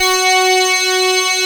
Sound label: OB KNIFE F#4, OB Synths, Analog; E-MU Formula 4000 Series Vol. 3; Analog Odyssey